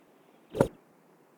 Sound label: Golf practice swing